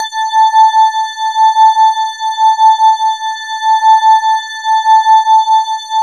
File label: E-HARP, DX HARP; Wizoo - Powered DX